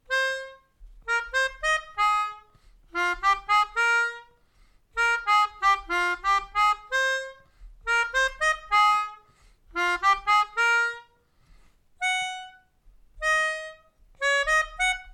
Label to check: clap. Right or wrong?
Wrong